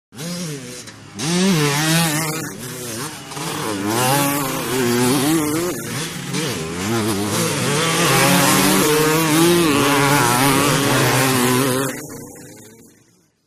Motorcycle Motocross; By 250 Cc ( Several ), Cu Fast Bys R / L, Shot Cu.